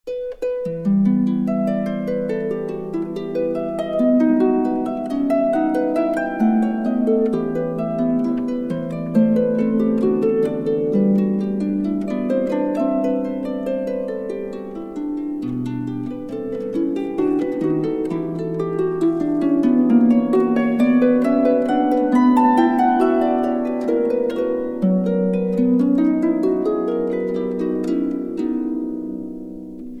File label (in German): allemande harfe solo